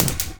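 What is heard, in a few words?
IGNITION SPARK, household; AKAI Anime Sound FX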